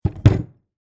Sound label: Impact Heavy Object, Set, Down, On, Wood, Table, Hard; Miscellaneous Impact Sound Effects; BlastWave